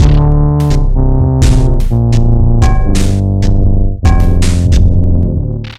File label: mission lose; Desert Storm WMD Sweeper